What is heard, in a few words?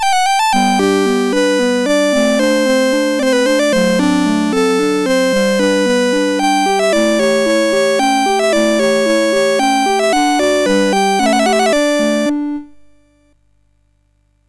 menu, MOS6581R3; Videobasic